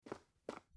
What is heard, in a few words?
footsteps